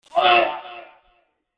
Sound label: choke